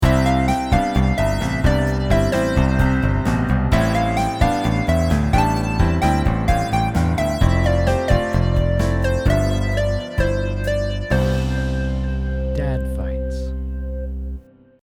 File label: Instro loud lead